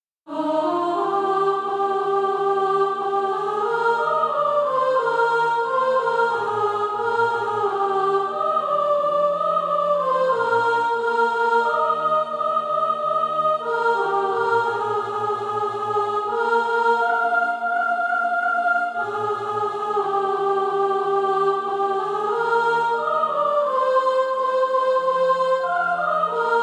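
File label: soprano, Practise Music; Crystal Songs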